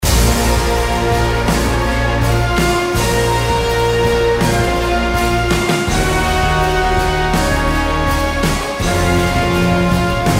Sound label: Ring Tone; Hulk Coaster